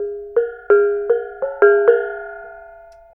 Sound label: BONANG; Spectrasonics; Heart of Asia; BONANG PH